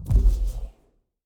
Rocket Launch; Ion Shift

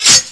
Damage Sword; Knight Online

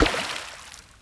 Pulse

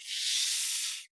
Rattle Snake; Rabbids Multiverse

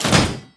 Shield Prepare shield; Pecheneg

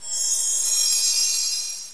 Total Cast

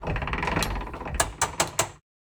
Ambience Scatter Machines; Santuario

